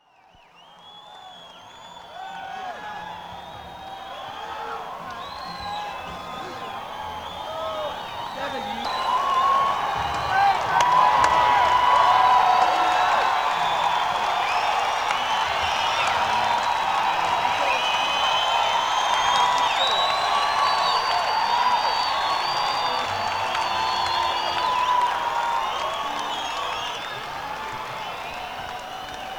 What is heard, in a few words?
Crowd; shure sm57